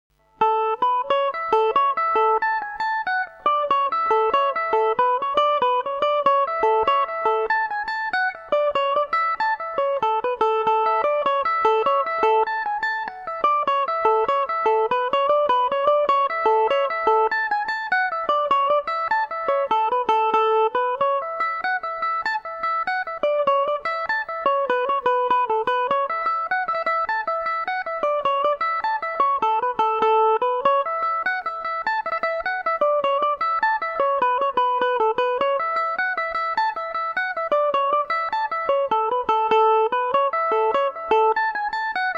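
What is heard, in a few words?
A major, irish mandolin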